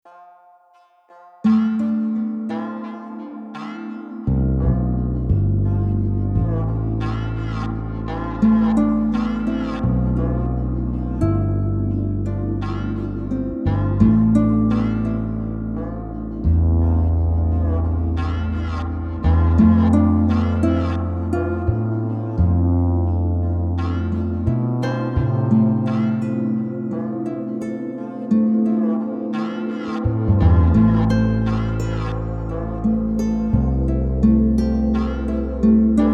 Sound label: Digerido Soulful